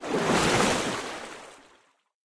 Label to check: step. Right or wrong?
Right